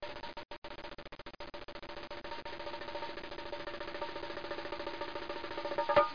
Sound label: bongos; Image Bonanza; Expert Software; music